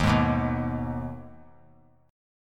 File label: D sharp Suspended 4th Sharp 5th; strummed; JGuitar